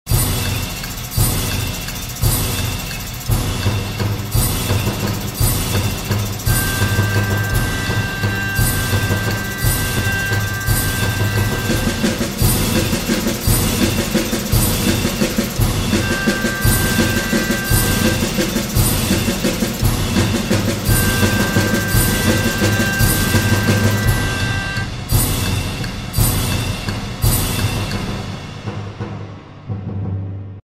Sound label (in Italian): Suoneria militare